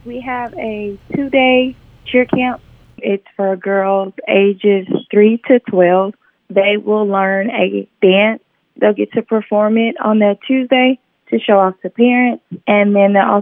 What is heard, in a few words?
Cheer